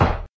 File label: shoot; HeavenStudio; spaceball